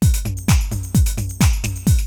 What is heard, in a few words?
house, loops